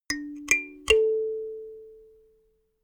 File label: notification kalimba triad up major